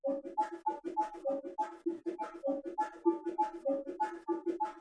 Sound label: Bongo